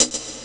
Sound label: hhat; ST-XX Amiga Soundtracker Sample Packs